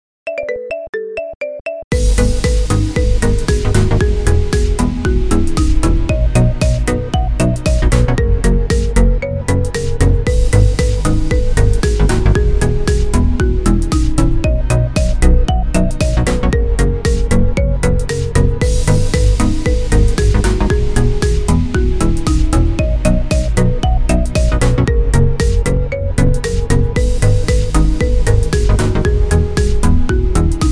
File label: marimba remix